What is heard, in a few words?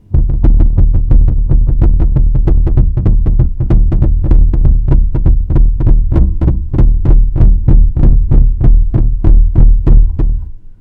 centipede walking; fowl-play; elder-centipede; enemies